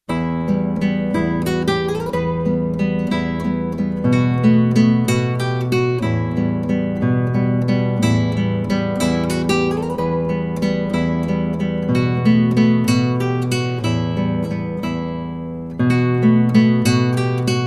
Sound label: valse